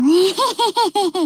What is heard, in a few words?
ehehehehehe; arona; blue archive